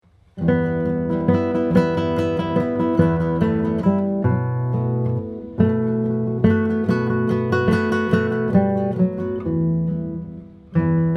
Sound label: Tumbalalaika, guitar